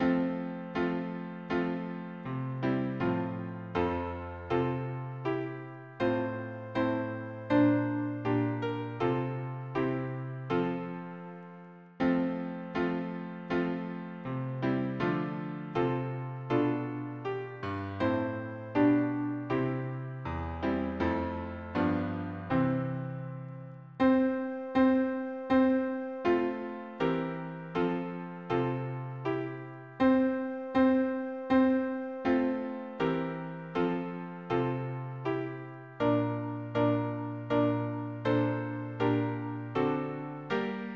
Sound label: harmony piano